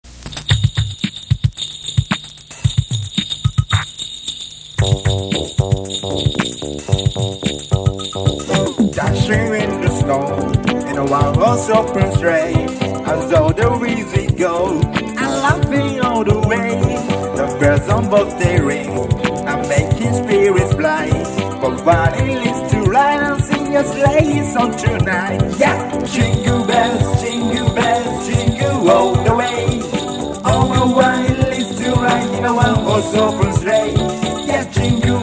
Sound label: jingle bells